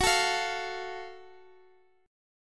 F sharp Minor Major 7th, Guitar Drop D; chordshape; down

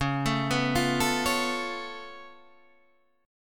C sharp Augmented 7th, C sharp, down; arpeggiated; C#+7; JGuitar

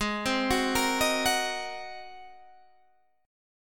G sharp 9th, Guitar, Open D

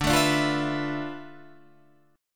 D Minor 6th Add 9th D

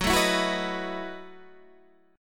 F sharp Minor 13th, Guitar, Standard; strummed; JGuitar

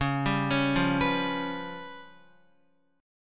Db 7th Flat 5th, Guitar; Db7b5 arpeggiated; JGuitar

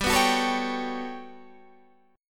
G Major Sharp 11th G down Guitar Half Step Down

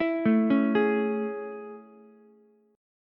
A Major 7th A, Ukulele; AM7 arpeggiated; JGuitar

